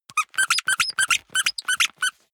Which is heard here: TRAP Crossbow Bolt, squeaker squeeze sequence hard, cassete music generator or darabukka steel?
squeaker squeeze sequence hard